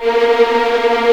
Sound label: A#3 TREM, VLNS TREMOLO, String; East West; The Ultimate String Collection